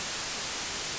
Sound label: STATIC; Ancient Asian Sex Secrets